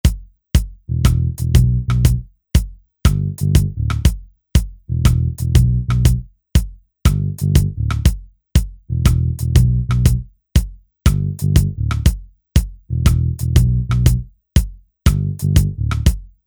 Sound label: Reggae